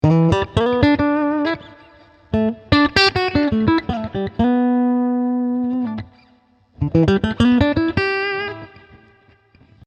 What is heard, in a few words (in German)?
Blue Note Röhren Amp Clean Solo Amp RVB; König